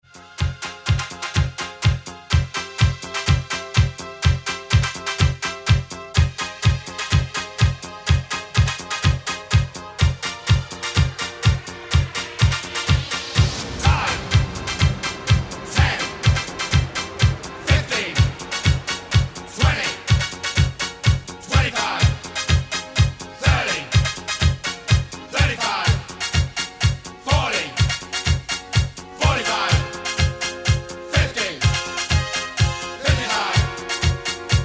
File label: high energy remix; High Energy coverversion